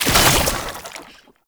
Underground Attack Tentacle Burrow; Warframe; Abilities

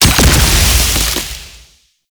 Grenade Explosion; Warframe; Lasrian Soldiers; Weapons; Lasrian Goonadier Grenade

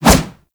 Melee Kick Whoosh; Weapons

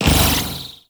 Briefcase Scythe Proj Bounce; Warframe; Corpus

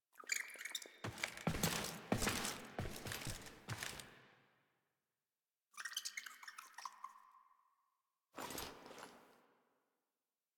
Keys Foley; Nyx; Warframe; Cinematics; Hub

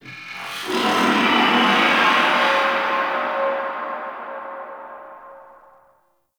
Orb Intro Distant Vocal; Venus Open World; Objective Rooms; Deck Twelve

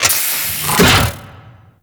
Iris Door Close; Ambience; Gameplay; Warframe; Corpus Railjack; Comet Shredder

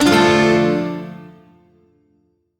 Shawzin Maj Chord; B; Warframe; Duviri

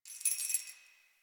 Keys Jingle; Warframe; Ambience; Nineteen Ninety Nine

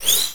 Fully Charged, bow; Daikyu Prime; Warframe; Weapons; Tenno

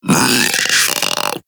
Infested Splitter Split Top Vocal Attack Medium

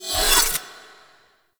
Proc Block Ability End; Warframe; Warframes; Helminth Abilities

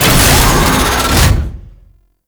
Tank Turn Full; Enemies; Movement; Lasrian Tank; Warframe

